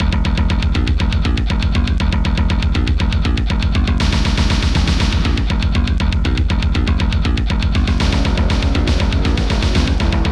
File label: Primitive War Win; jurassic war ms dos gamerip 1997